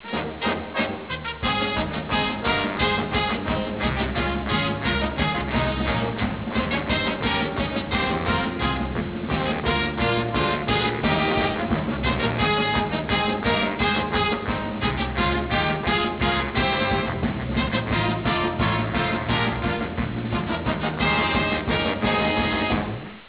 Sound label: fightsong